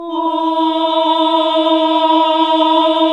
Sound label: OHH E, Nuns Ooohh; Sound & Vision - Gigapack II